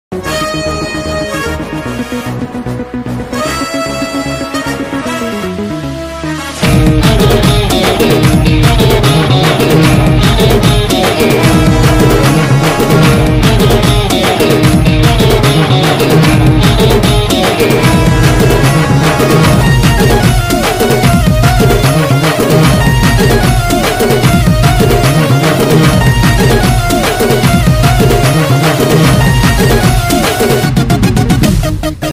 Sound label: dada sambalpuri song bgm ringtone